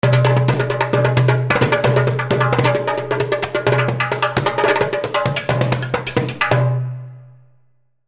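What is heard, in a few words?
darbuka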